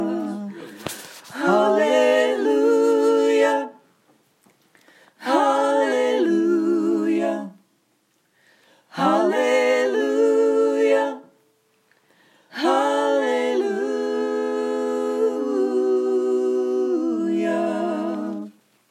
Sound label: Hallelujahs